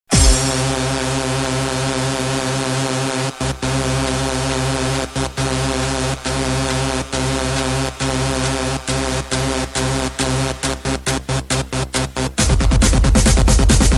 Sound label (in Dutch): Instrumentaal; Kermis Algemeen Deel 2